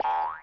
hi-hat, Millenium MPS-400

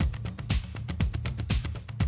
120, Classic Drum Loops

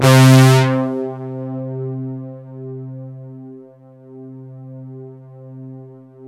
JV POLYBRASS, JV-1080; Syntec; Wall of Sounds

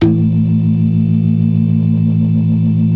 ORGAN, 4 DRAWBARS; Keith Emerson Signature Series

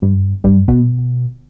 Korg Prophecy hits, arps and fx

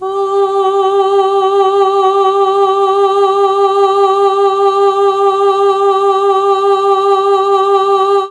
G2 FEMALE, Mellotron; CHMB FEMALE; Mike Pinder - Mellotron Archive CD

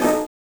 Orchestra String; Genesis

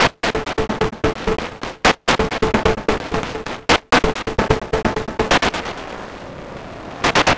Crunchy, Beats

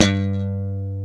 GTR Funky Strat, Funk & Mute

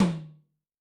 Tom, Yamaha DTXpress II

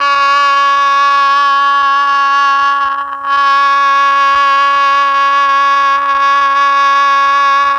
MEL OBOE

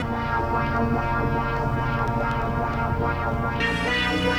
Ambient; Sonic Foundry; Sony Creative Software; Stylus Pressure Urban Grooves on Digital Wax; Nu Tang

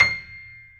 PNO, Piano; AKAI S6000 CD-ROM - Volume 1; CS PIANO

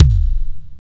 thump kick; Greytsounds VOL-1; PROCESSDRUMS

